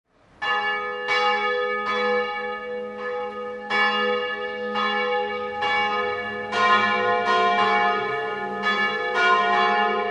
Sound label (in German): Glocken; Neustadt Donau